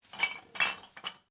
GLASS BOTTLES RUBBISH MOVE, Bag of recycling bottles; Glass (Sound Effects:Materials)